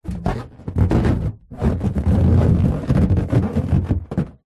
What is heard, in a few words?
balloon inflated handling creak; foley